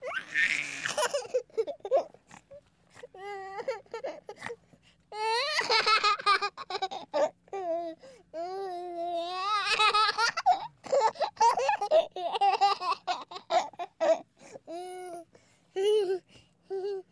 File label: Single Small Boy Lau